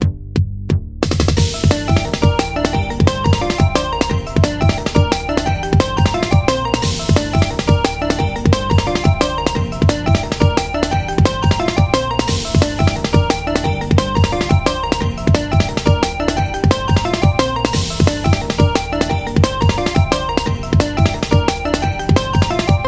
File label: Instrumental; friday night funkin vs. umbal family computer